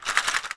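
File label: SHARK CALL, ethnic percussion; WorldSounds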